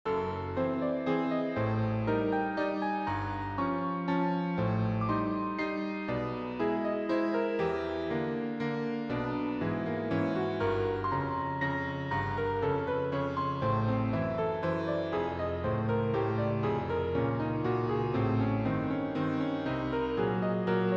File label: waltz